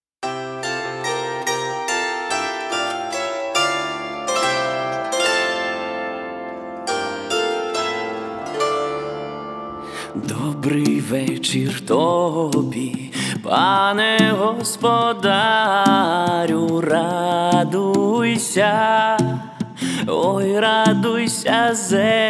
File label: Ukrainian folk group